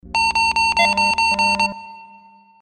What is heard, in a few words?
SMS space message